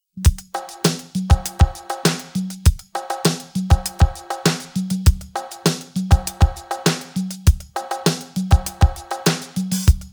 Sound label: drums pattern